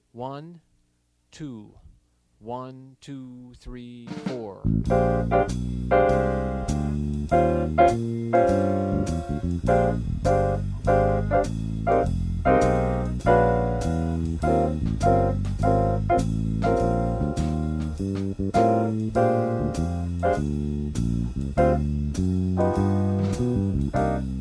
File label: Bb Blues Audition 2 Choruses